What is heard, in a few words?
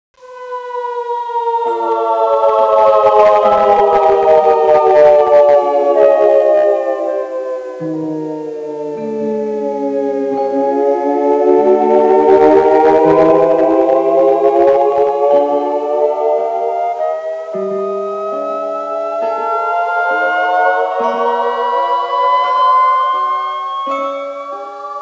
hell music